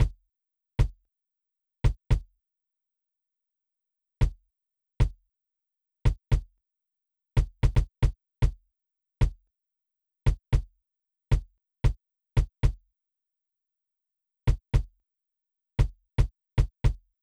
Kick Proc No Sample

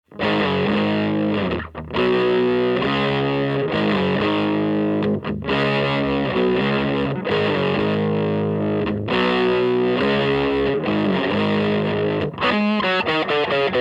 Phase EQ Submix C414 SM57 Flip RE20 Flip

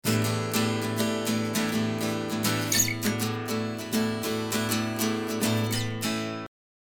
Guitar Fretboard SDC; Recording Mistakes

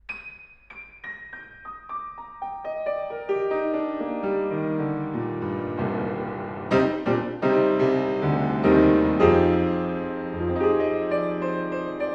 Piano, Horiz Open Mic

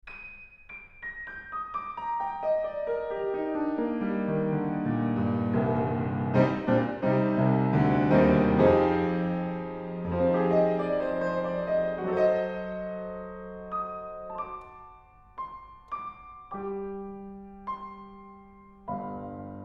Piano, Horiz Off Mic

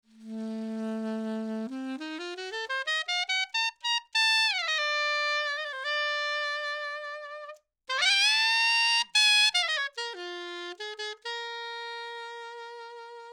Sax Soprano 20cm Low Bell

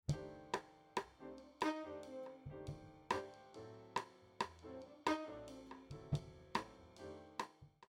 Snare Mix Proc EQ Gate

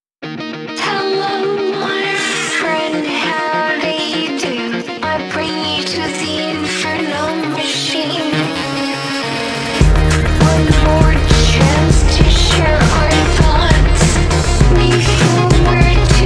Mix Samples FX

